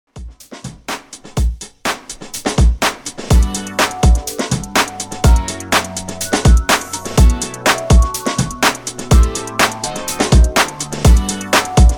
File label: REDRUM 124 BPM